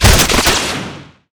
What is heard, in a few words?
Mgun Shell